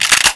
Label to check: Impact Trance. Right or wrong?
Wrong